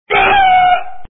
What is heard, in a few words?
Game Over; Extreme Run